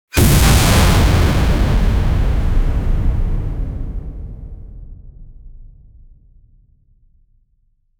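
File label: a multiverse scale explosion; universe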